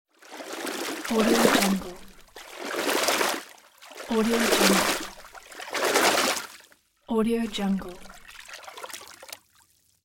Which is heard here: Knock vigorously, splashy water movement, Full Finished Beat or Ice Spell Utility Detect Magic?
splashy water movement